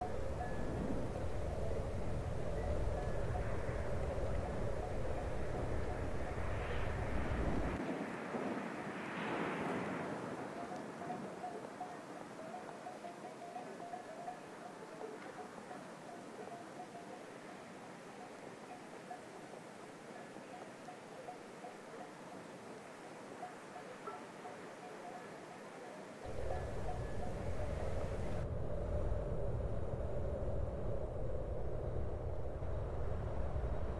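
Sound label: background ambients, stadium; Kids Games; DribbleKingsFootball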